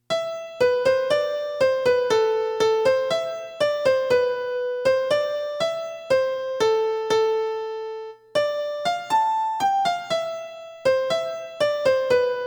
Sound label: black midi slowed down my yamaha dgx205